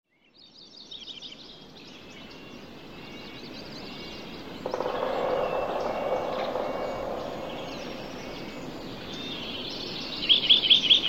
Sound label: sequoia high country